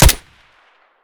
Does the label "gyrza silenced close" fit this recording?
Yes